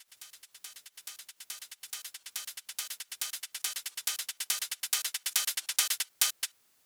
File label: Loop Fillin, 140BPM, Drums & Perc; Gminor; Vengeance Samples; VTS1 Devastating Truth Kit